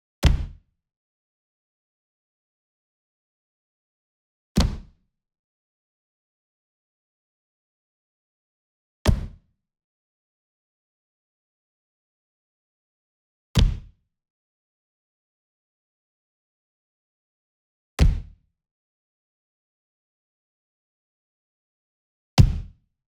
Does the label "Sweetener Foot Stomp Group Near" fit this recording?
Yes